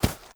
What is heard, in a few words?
horse footstep sand hard; Rust